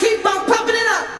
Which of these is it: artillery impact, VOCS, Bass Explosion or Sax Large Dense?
VOCS